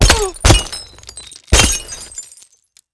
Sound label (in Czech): sklo; Mafie 1